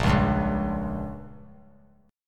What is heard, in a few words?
A sharp Minor 6th; 10,8,10,11; strummed; JGuitar